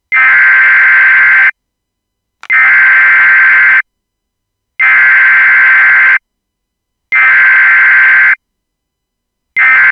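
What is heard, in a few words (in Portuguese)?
um som agradavel de aviso, alert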